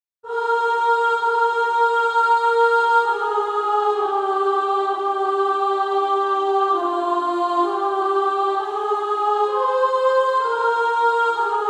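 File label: Female Choir, soprano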